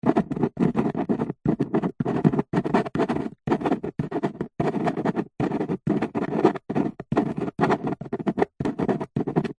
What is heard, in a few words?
office handwriting pen write on cardboard box